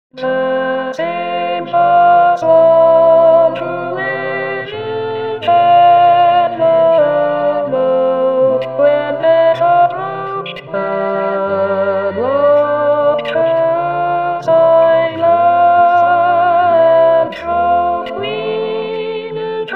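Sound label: Silver Swan Alto 1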